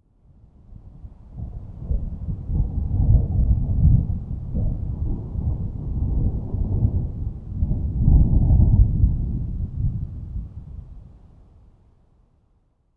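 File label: thunder distant; ambient soundscapes; dota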